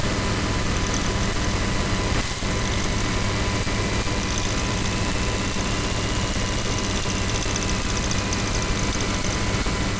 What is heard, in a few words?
knock